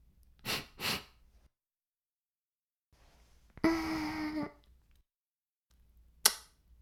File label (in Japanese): フェラ音声, ASMR; 白くて黒い魔性円環